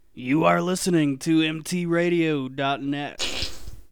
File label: fart